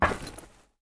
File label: metal footstep